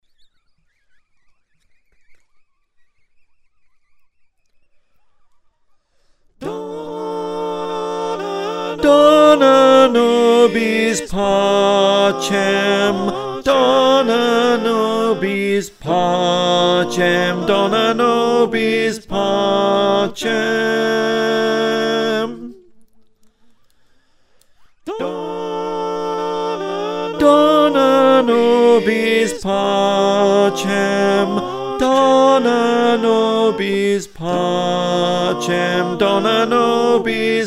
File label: Tenor, choir